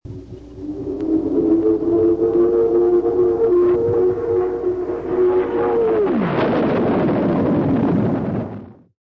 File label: power dive